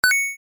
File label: Retro Keypickup; SFX Pickups